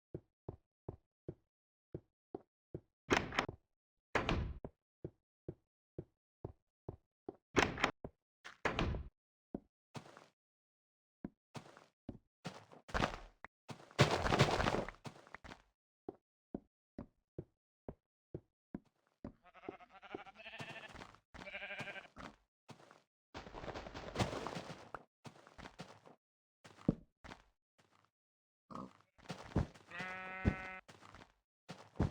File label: teleporter